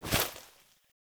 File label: Female Foley Action Default Melee Heavy Swipe, Female Default Clothing; Characters; Player